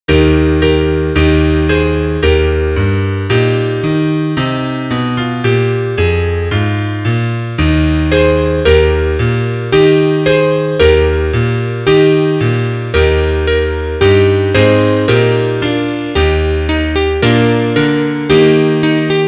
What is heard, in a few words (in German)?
Klavier; laterne sonne mond und sterne